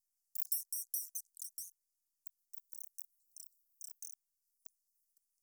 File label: Rhinolophus mehelyi, batcalls, europe